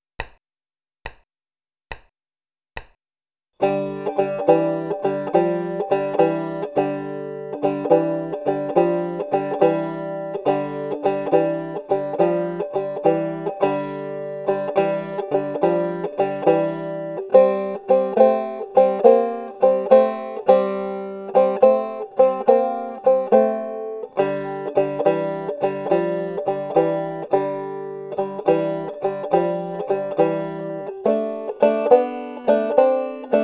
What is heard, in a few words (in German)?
12 bar blues begleitung